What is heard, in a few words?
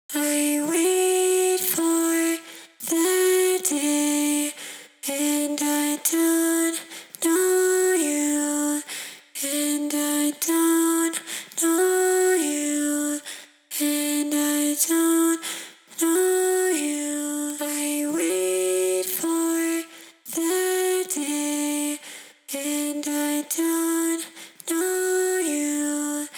house to myself vox